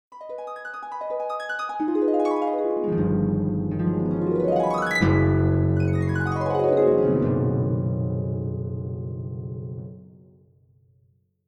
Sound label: glissando, harp; Pianoteq 8